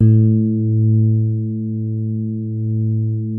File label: COND HARD A1, E-PIANO; Music Store - Claudius Diemeter Schwerlos